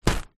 leisure kite nylon hit ground

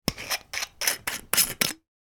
vodka bottle lid unscrew

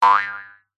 cartoon spring boing jump jaw harp

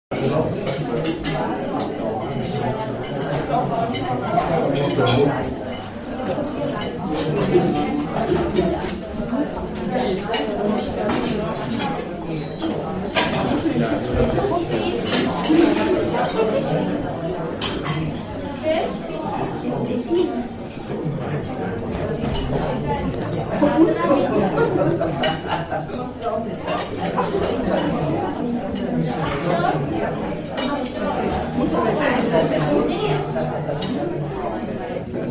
PUBLIC Busy Restaurant Crowd Coffee Shop; ATMO; smartsound

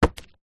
footstep single on loose wooden panel; zapsplat

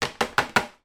household dustpan empty in bin; sound effects one

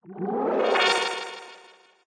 synth space weird synthy sci fi computer activate

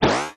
comedy spring; sound effects one

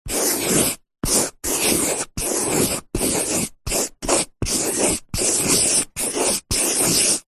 office pencil writing big letters on paper hard

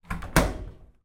household dryer machine door close; zapsplat

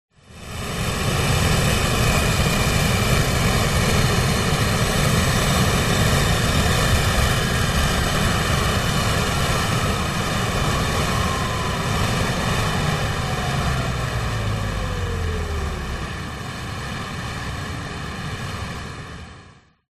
JET MILITARY A-10 Thunderbolt Turbine Slow Down; TRANSPORTATION

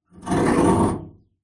foley metal grind scrape on metal hollow large

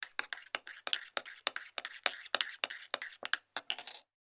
food stiring mug of powdered soup

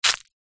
dry leaf crunch step on; zapsplat; foley

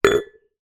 glass jar large down concrete ground; household

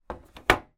make up case down on hard surface; household

